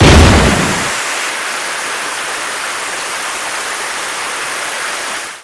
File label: explode cry